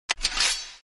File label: Click icon